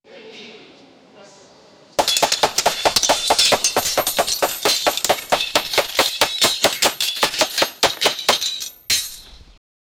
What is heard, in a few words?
swords catapults and hors, horse hooves